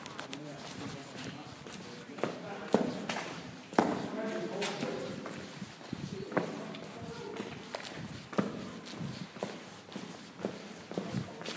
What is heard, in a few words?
tunnel voices and horn, Crabble Meadow Dover